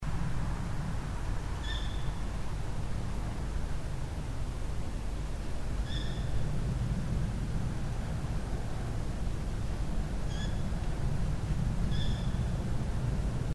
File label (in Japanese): hukurou nakigoe youtyo